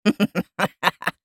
laugh; poppy

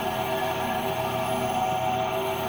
astral blessing loop; LoL; soraka

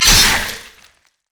heat seeking missile; LoL; urgot

